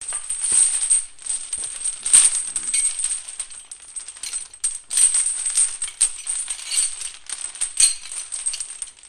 chains

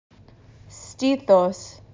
bp chest

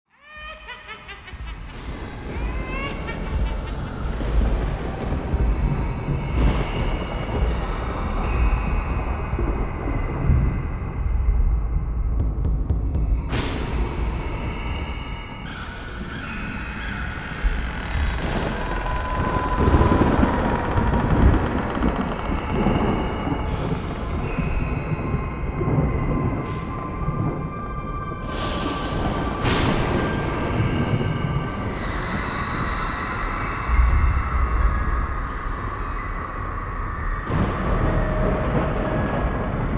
spooky thunder

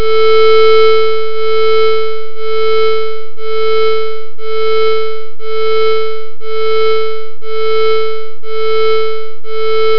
VCA

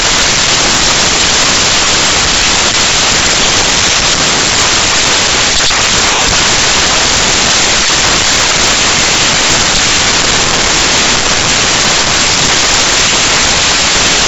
jbl flip 4

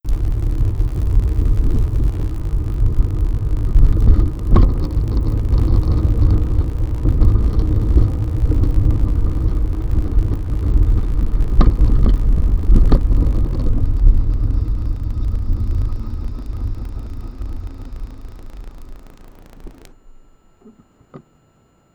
bumps test

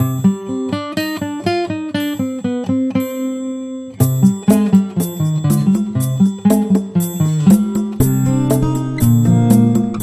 Moody Loop

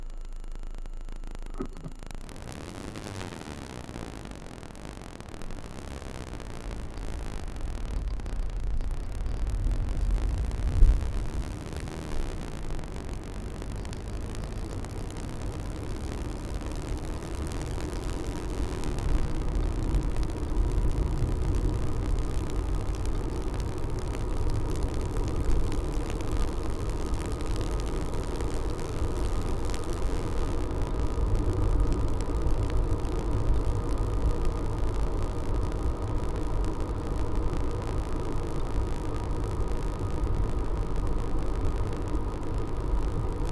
test normal road

